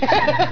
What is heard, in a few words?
ehhe